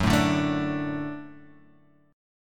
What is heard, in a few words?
F sharp Minor Sharp 5th, Guitar, Half Step Down